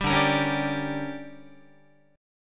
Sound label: D Major 7th Suspended 4th Sharp 5th, Guitar